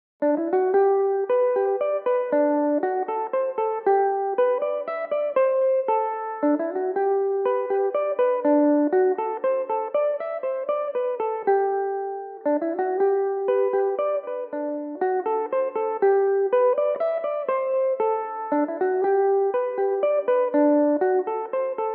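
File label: locked down waltz G major, irish mandolin; Belmuse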